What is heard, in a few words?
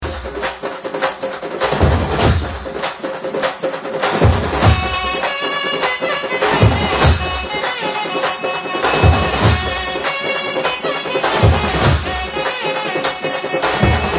Beat Ringtone